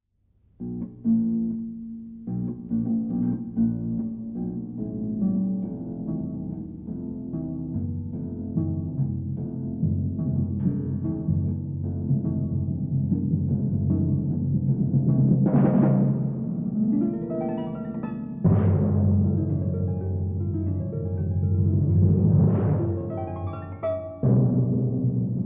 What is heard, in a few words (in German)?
duo harfe und schlagzeug